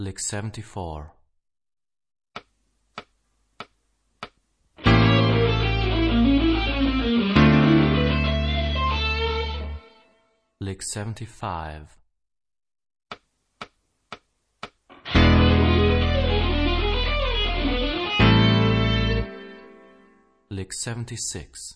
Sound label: Rock guitar; Rock gt Secrets